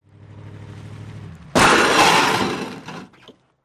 Drop Impact Moving Vehicle Collides With Stationary Vehicle, Centered, Scraping Metal And Tire Squeak